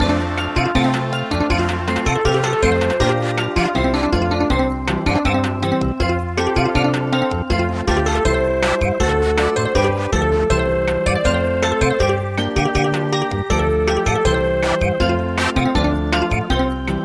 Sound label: Samba; Gold Pearl children